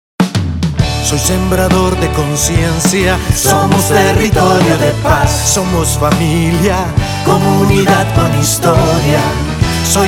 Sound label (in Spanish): Jingle Completo